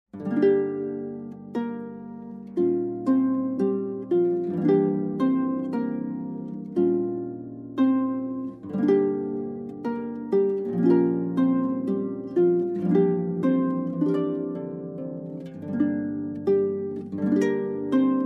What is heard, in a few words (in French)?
Rhapsodie pour la Harpe